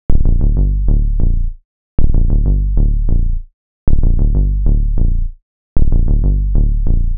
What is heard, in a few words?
Acoustic Techno Flutter Bass, bass loop